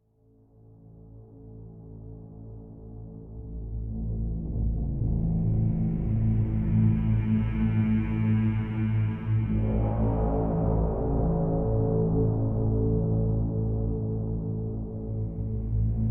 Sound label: Suspension Ambient